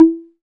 low mid tom one shot E key, tom drum single hit tuned to the E note